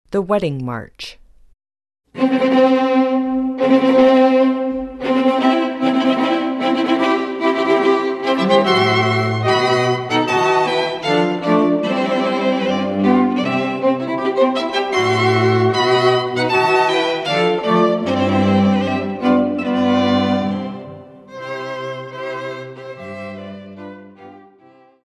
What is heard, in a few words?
wedding march, string quartet